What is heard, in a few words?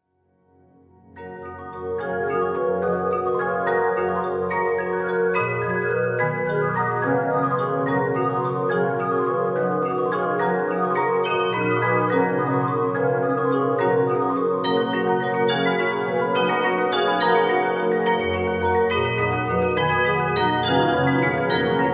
Waltz of the Snowflakes